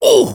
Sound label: Soldier taunt pain